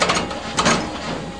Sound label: gear; RenderOrgan